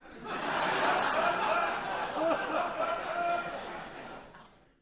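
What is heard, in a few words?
Crowd 100 Hearty Burst, medium interior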